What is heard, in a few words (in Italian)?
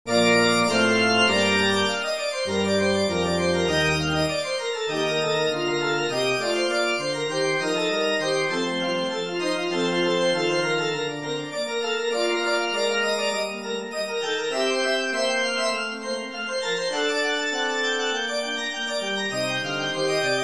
sinfonia, trascrizione da concerto per grande organo